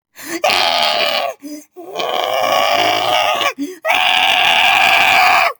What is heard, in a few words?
weirdest scream ever